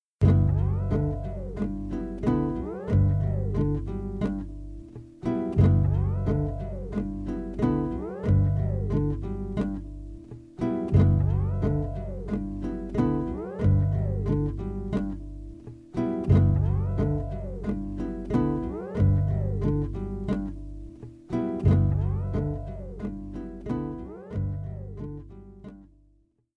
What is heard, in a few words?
loop